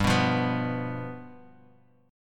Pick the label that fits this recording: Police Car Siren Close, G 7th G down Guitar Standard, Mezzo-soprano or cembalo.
G 7th G down Guitar Standard